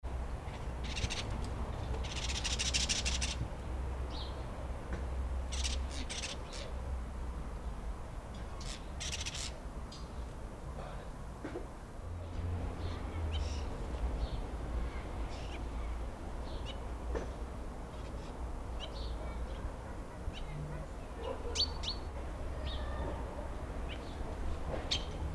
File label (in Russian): ptichki ulicca sobaka